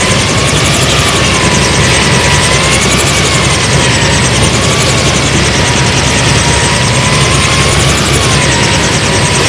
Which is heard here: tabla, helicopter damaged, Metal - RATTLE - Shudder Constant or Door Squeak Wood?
helicopter damaged